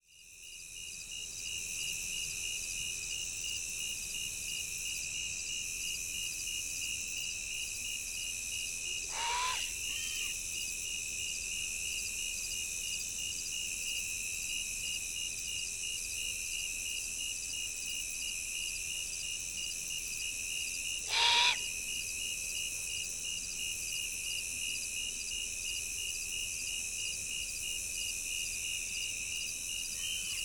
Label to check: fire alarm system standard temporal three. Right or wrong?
Wrong